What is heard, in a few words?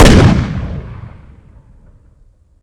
shotgun fire; weapons